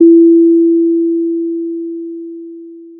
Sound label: pop, Sound of a doorbell